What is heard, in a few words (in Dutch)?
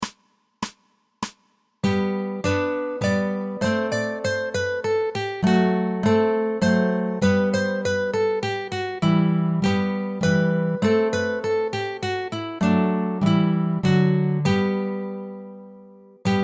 caraibisch